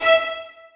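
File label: 2nd violins staccato e5; Sonatina Symphonic Orchestra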